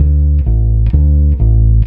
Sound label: Simple 5ths, Bass Drum Kicks